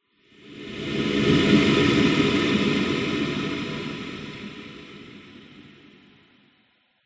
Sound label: horror scary breathy growl designed